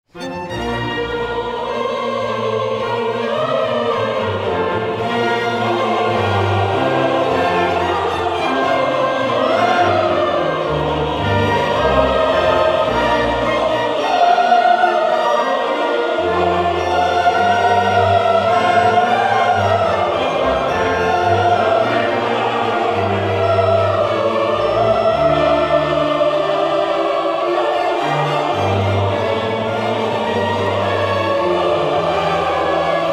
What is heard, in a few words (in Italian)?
Messa da Requiem per soli, coro e orchestra- Amen, Allegro